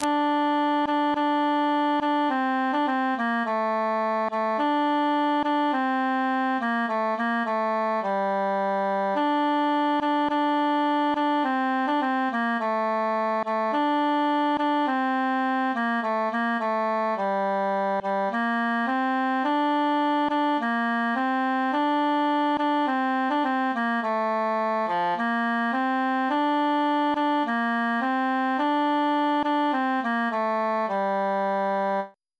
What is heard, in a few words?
tenor solo; pastime with good company